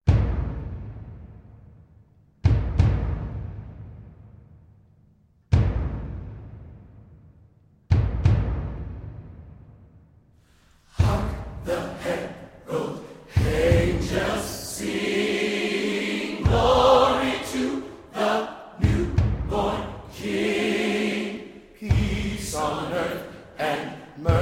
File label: Hark The Herald Angels Sing Tenor; Christmas 2020